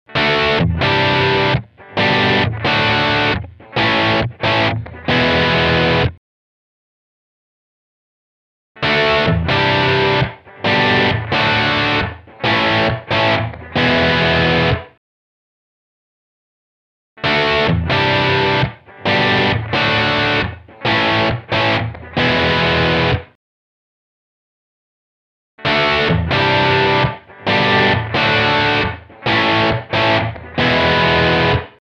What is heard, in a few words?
reflections EQ; BigSky; reverb; Shape